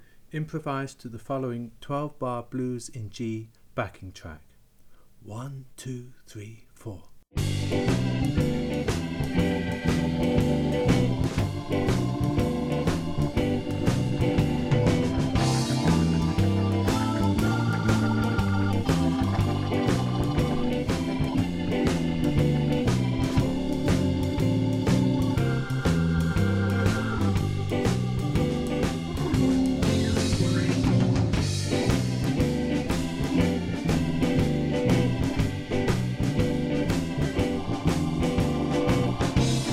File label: 12 Bar Blues Backing